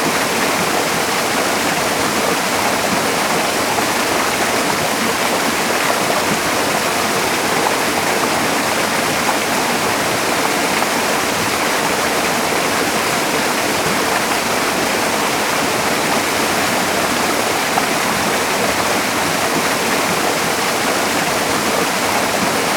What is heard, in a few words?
Waterfall; 環境音